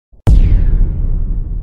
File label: shinning aura ball